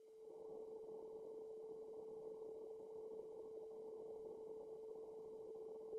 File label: sine 440Hz L half lag